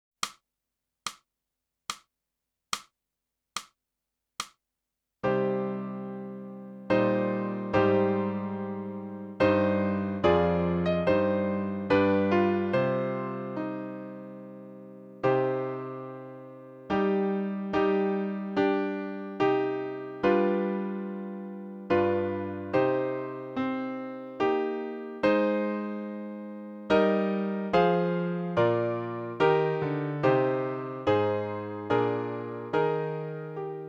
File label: sax david of the troika